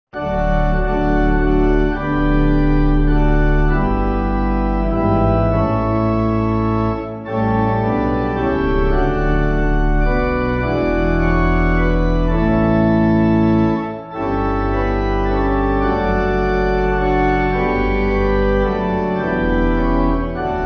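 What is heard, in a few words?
Pipe, hymn backing; O Master Let Me Walk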